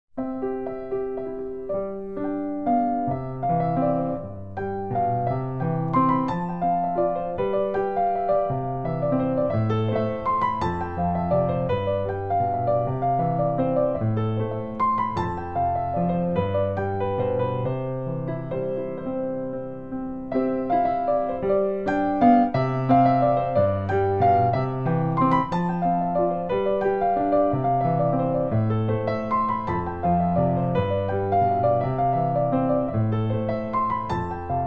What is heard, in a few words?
piano sonata